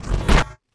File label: wooshes transform sweetener